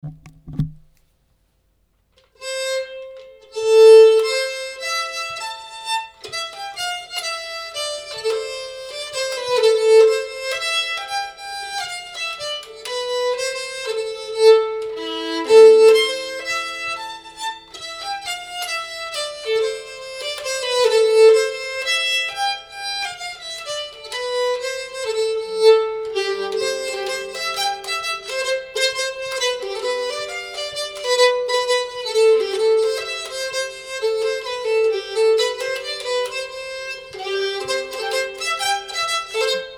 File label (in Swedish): a moll vals; bysscalle